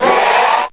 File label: growl group, Voice; ooh ahh